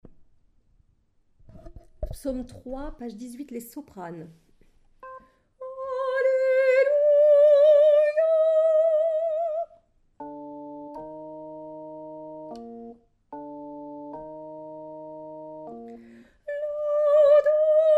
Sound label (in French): psaume Soprano